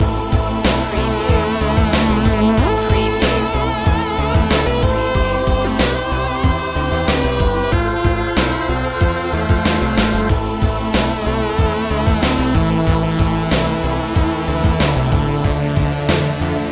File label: retro video game hotzone